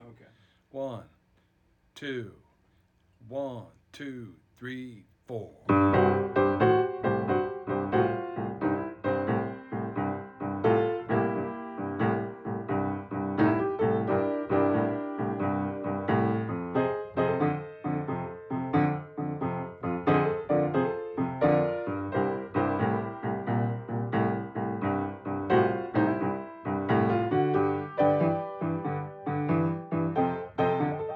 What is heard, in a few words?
shuffle